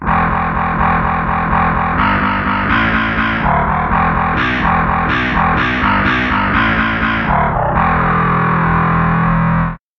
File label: overdrive guitar bass samples